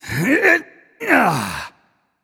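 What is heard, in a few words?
nonverbal grunt lift; voicepack; smite; merlin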